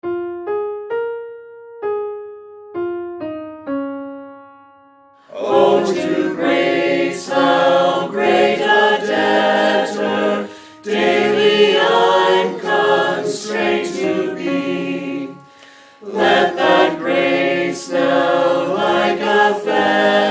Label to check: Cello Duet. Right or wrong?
Wrong